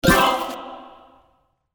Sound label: Minor Clover; goldenClover